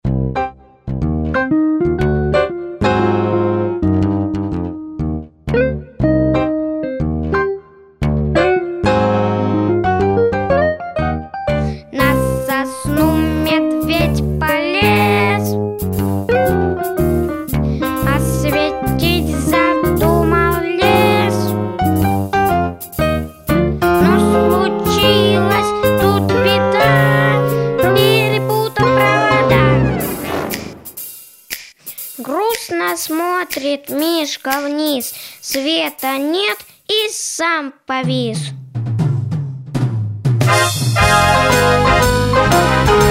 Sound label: bear blues